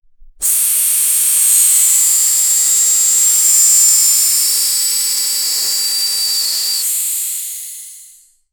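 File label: high pressure air escaping, tire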